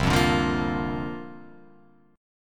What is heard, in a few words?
C 9th Suspended 4th, Guitar Open F, chord; C9sus4 strummed; JGuitar